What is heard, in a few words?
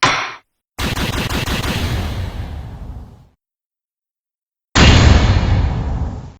game over